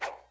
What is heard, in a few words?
hammer throw; nsmb